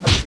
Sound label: FIST HIT; Total Control - Action Cutz